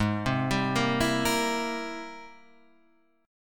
Ab Augmented Major 9th Ab Guitar Standard; arpeggiated; 4,3,5,3,5,6; Ab+M9; JGuitar

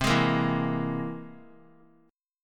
C Augmented 7th C down 3C, 3F, 3A, 3D; strummed; JGuitar

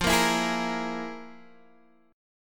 F Minor Major 7th Double Flat 5th Guitar Open D; down; chord shape

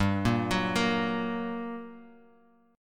G-Minor Major 7th, GmM7 chord; arpeggiated; 3,1,4,3,x,x; JGuitar

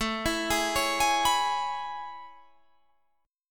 A Major 9th A

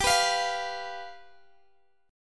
G 7th G down Guitar DADGAD

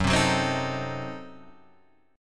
F sharp Diminished 7th F sharp Guitar Standard; strummed; 2,3,4,5,4,5

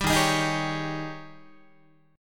D sharp 11th D sharp, Guitar; D#11 strummed; 10,11,11,13,11,12

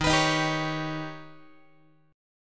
D sharp Augmented 7th Guitar; strummed; x,11,14,13,11,12; JGuitar

